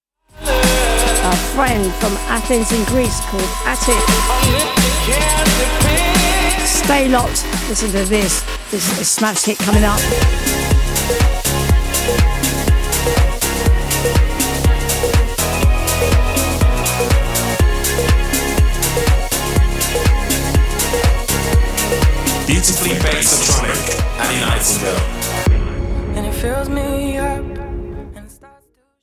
drum sound bassline

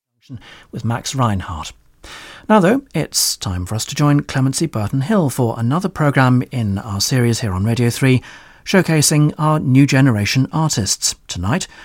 for voice and piano; herbert howells

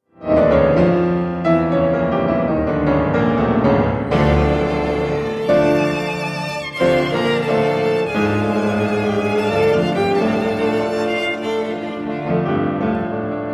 piano quartet in A; gustav mahler

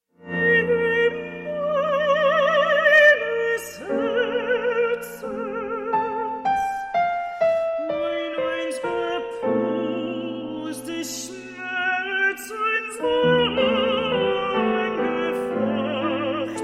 voice and piano; um mitternacht; 5 ruckert lieder; vers for voice and piano

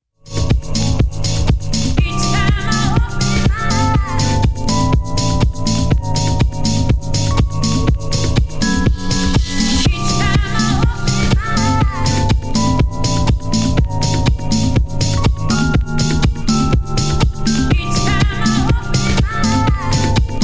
drum ensemble

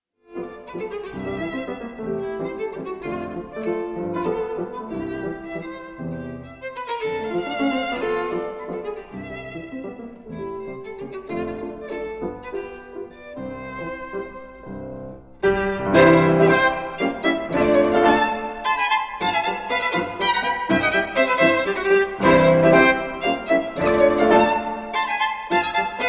old viennese dance no 1 for violin and piano

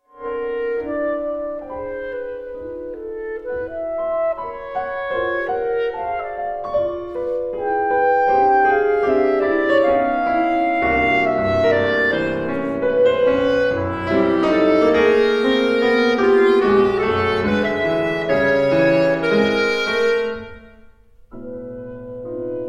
for basset clarinet and piano; mark simpson; gary carpenter; marking time

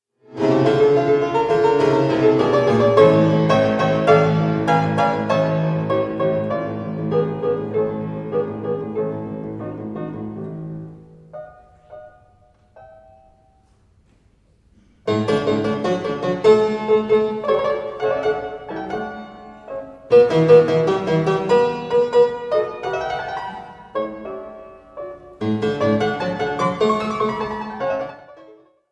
piano sonata in d major k576; wolfgang amadeus mozart

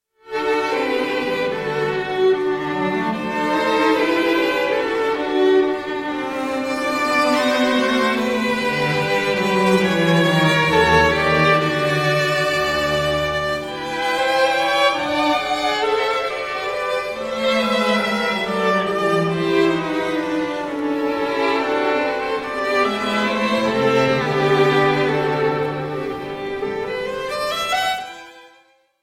string quartet in C op 76 no 3 2nd movement

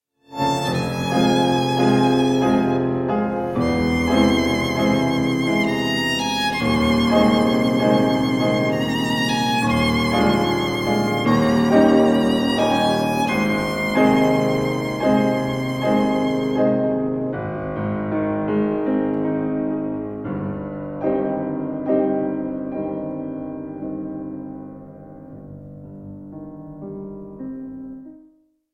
sonata in C minor for violin and piano; edvard grieg; sonata no 3; op 45; 3rd movement allegro animato

